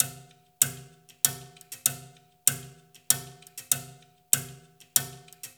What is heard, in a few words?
TIMB RIM; Spectrasonics; Liquid Grooves 1 & 2